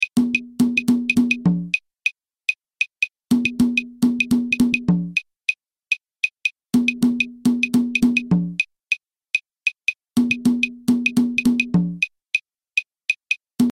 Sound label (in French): guarapachangueo cajon clave; Naissance du Guarapachangueo